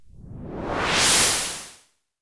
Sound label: Whoosh, sci-fi